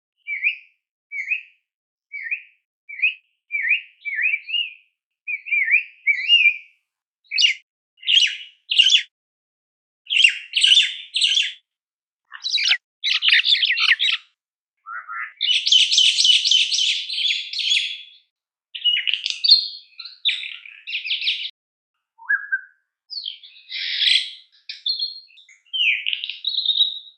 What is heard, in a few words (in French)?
Martin triste, oiseaux de Mayotte; oiseaux terrestres; faune de mayotte